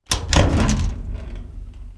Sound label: brass; Mafie 1